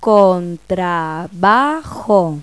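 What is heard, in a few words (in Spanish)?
contrabajo; Pipo-Aprende musica con Pipo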